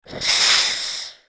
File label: steam release